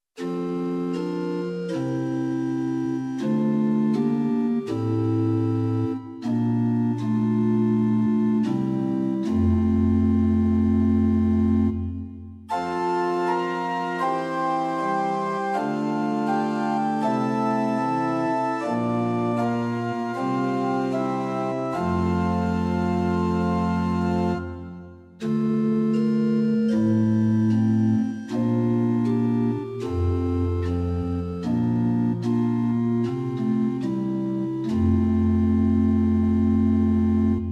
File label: Pitch 440 Temperament Meantone